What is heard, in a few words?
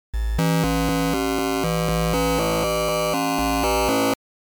wrap, lsdj wave synth